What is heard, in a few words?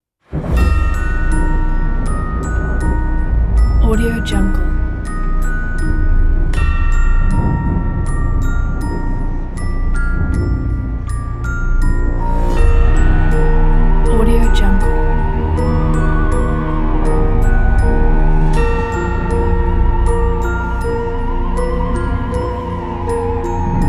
spooky halloween christmas